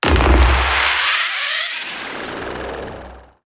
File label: Restart